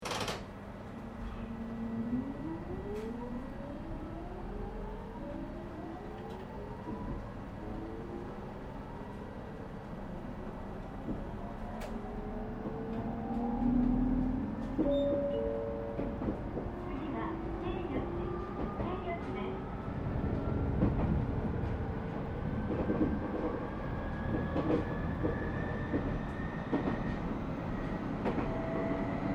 Train Regional Local Interior Startup, Japanese Trains; Sannohe; Vehicles; IGR